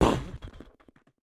Dash Impact; PixelSphinx; Ajouté son spécifique au dash

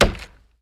Chopping wood; Axe; gather-and-defend

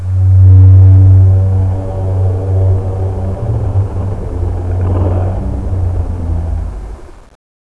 Robot Whale Scream; drone whales

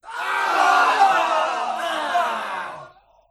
Wave Start; ludumdare50

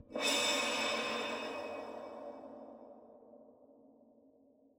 Stinger

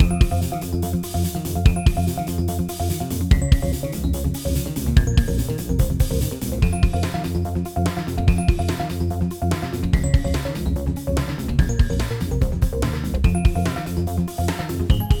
floor is lava loop

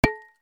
pickaxe impact rock; gather-and-defend

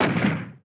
Asteroid Impact; asteroid 3d surround sound! (left/right); PixelSphinx

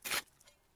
farming impact regular; gather-and-defend

